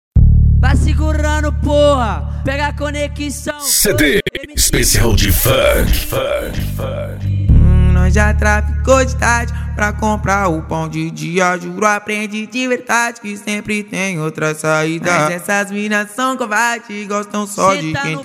funk

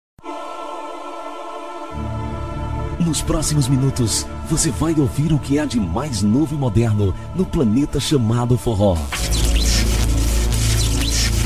abertura forro ao vivo

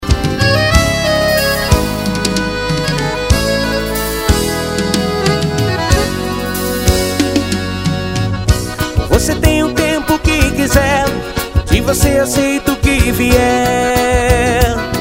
forro ao vivo

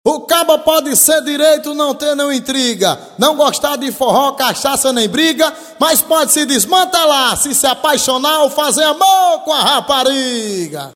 toada

